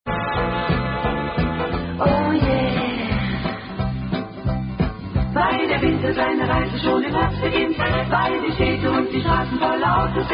Hawaiian War Chant, German Version, Lacking Opening; wnyc-org